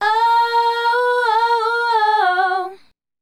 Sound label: Female Group, Hip-Hop and RnB Vocals